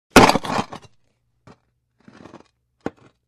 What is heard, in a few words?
Stone Scrape With Metal; Hollywood Edge Foley Sound Library